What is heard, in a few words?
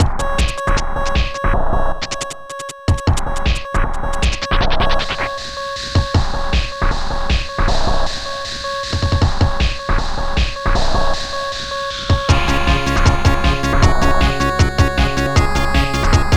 Internal Percussion